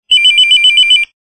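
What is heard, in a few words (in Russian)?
звонок на черно белый телефон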